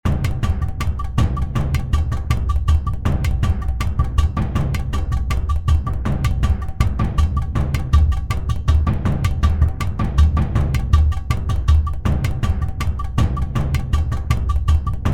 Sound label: Udu and Big Burundi